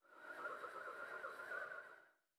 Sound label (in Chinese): 旋风人旋转声